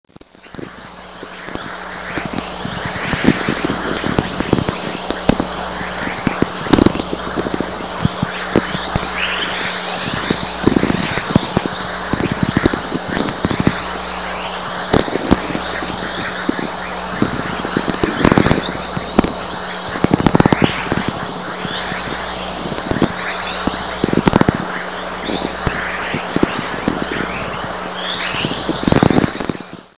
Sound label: chorus; Natural VLF Radio Emissions